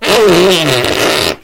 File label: fart medium